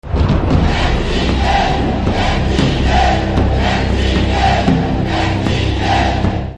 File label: public voice ringtone